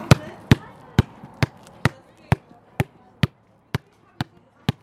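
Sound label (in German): ballribbeln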